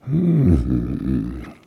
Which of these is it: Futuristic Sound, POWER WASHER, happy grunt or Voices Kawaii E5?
happy grunt